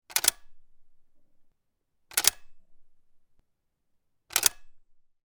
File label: gun arisaka; still north media